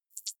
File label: click light, signale